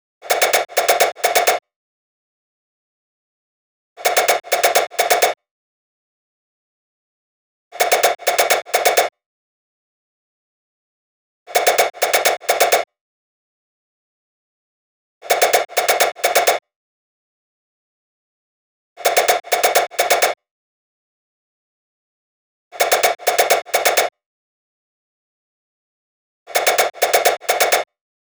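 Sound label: 128BPM Drop Lead dry SC, Synth, F minor; Vengeance Essential Clubsounds Vol.5; VEC5 Demo Constr Kits; Mainstage Kit; Root Fmin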